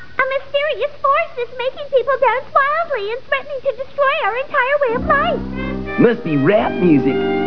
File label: rap music